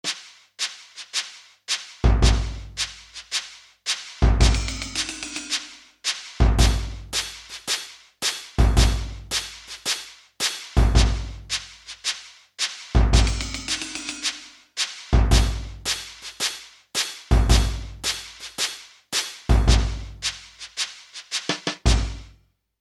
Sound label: drum practice music; Majoras Mask Official Soundtrack